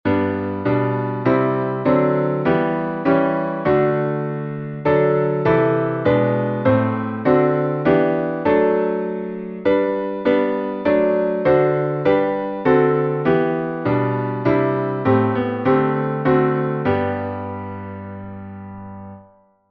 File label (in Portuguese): salmo instrumental